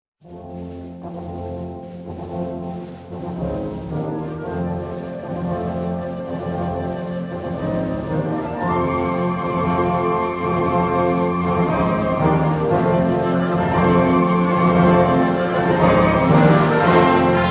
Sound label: fanfare and theme